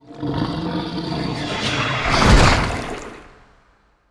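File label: kraken attack; monster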